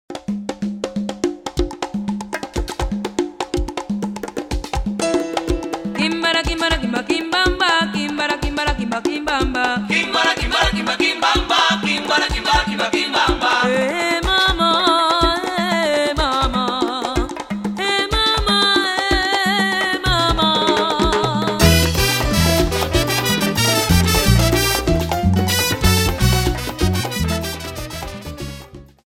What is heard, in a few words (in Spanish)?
guaguanco timba